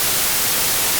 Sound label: Tv screen static